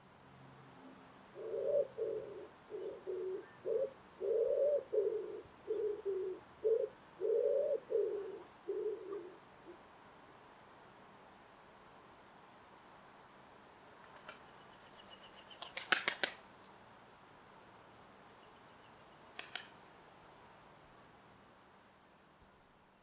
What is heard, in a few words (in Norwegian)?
ringdue columba palumbus palumbus